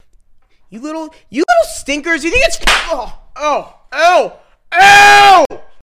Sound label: steak slapping sound